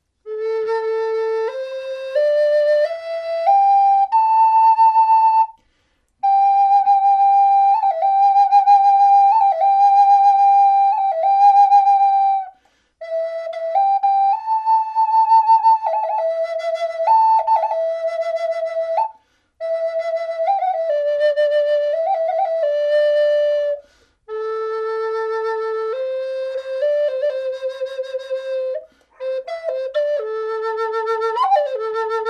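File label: natural, flute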